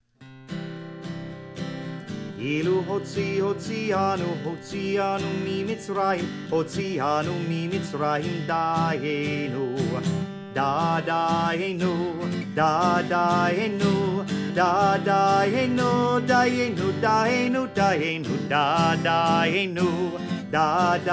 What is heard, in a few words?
Dayeinu with Guitar; passover